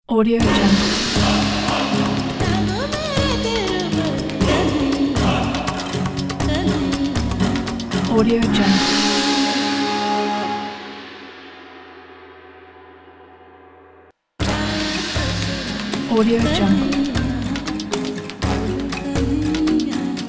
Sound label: africa ident